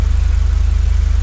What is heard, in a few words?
idle, thewrangler; gtav; vehicles